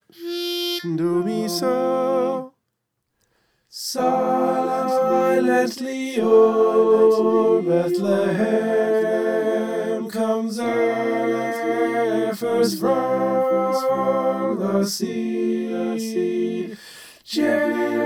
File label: soprano